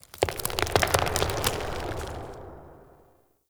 Root Movement, Root Cave; Ambience; Neathuns Root Cave; One Offs

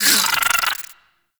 Infested Burst Rifle Reload, weapons

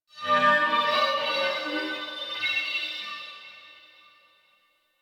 Ship Energy Flow Texture Med, Ambience; Warframe; Corpus Ship Remaster

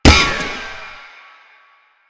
Shield Impact; Warframe; Lasrian; Lasrian Tank

